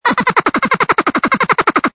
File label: ANIMAL CALL